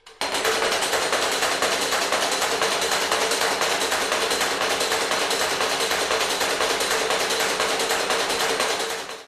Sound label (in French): bruits chocs